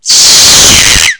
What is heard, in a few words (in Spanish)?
Corcho